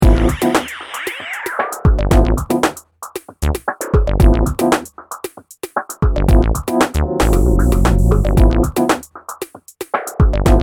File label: Music loop